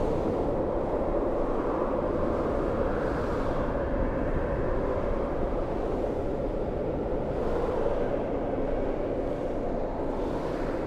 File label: Mountain Shelter Amb Quad Loop; Journey